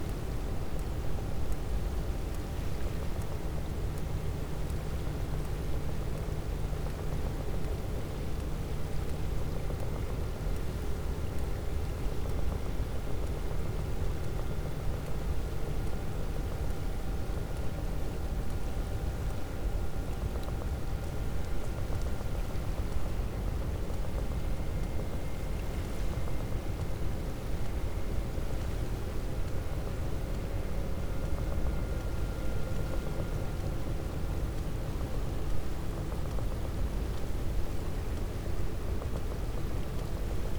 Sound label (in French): cachalot positif, Physeter Macrocephalus